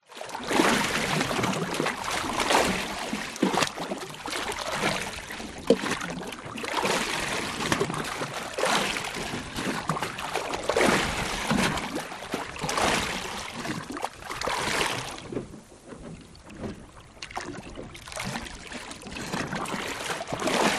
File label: transport boat water waves wash against stern outboard motor 16 ft fiberglass boat